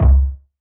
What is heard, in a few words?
Vintage Percussion Boom; Cymatics; Mystery Pack Vol 4